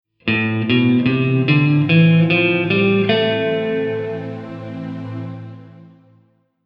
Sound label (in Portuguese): Escala de La Menor Natural